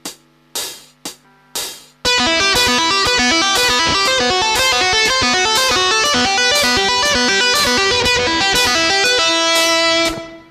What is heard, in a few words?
tap